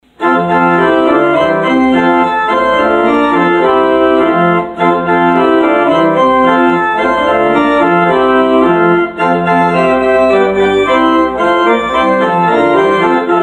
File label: Christmas Eve Postlude